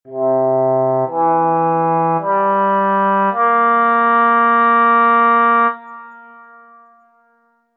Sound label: oboe transition music cue; ساز ابوا